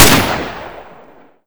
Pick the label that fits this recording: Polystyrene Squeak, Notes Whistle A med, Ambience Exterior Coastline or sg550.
sg550